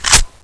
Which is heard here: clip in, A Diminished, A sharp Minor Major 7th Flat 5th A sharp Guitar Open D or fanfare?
clip in